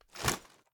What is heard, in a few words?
reload empty fast end; gmod